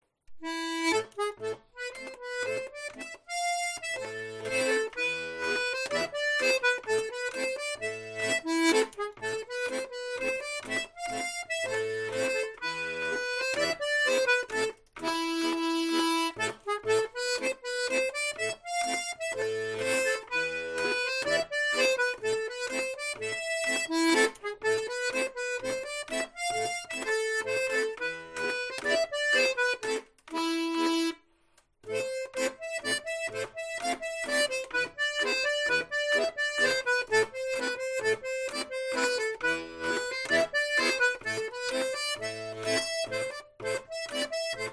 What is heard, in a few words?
tango